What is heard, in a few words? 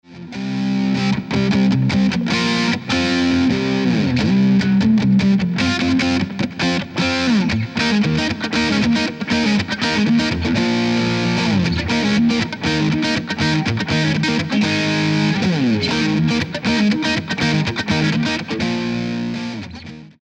Metal Dirt, Cyber Deluxe